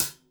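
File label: Hi-Hat, Drums Acoustic; USB Soundscan vol.10; AKAI; 90s sample CD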